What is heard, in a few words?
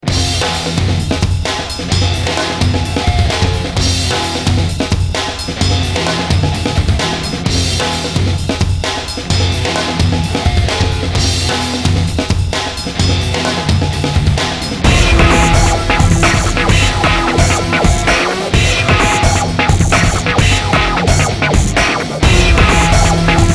Robot Fight; Infernal; xbox 360